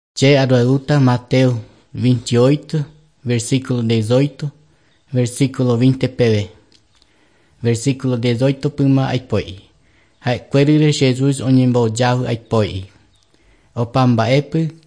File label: songs guarani mbya